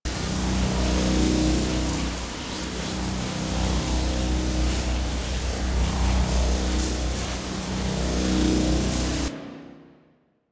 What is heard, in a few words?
Magnetic Field Loop; Warframe; Focus; Unairu